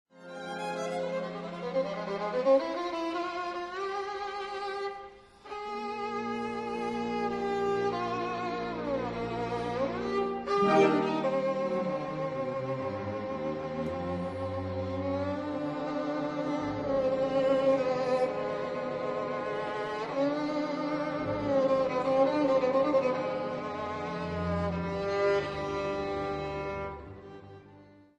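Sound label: Scène de la Csárda for violin & piano; Maros Vize; Op. 18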